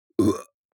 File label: human mouth burp grunt short; smartsoundfx